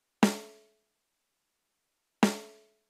スネア FB 最小; ディレイ

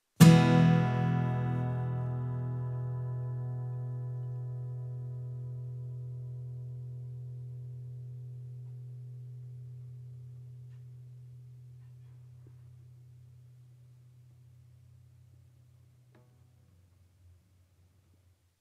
アコギナチュラル; コンプレッサー

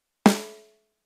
スネア 生 単発; ディレイ

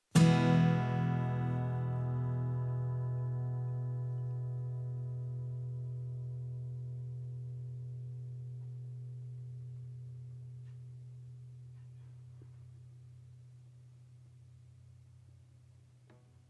アコギ アタック 最速 リリース 最遅; コンプレッサー

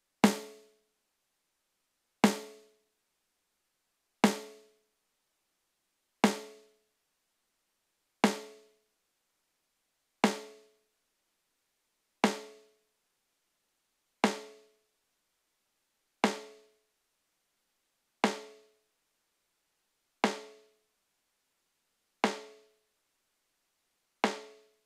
スネア; ディレイ; FB最大

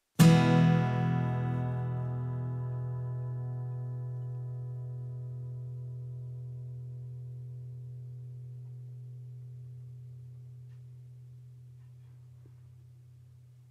アコギ アタック 0.8 リリース 50; コンプレッサー

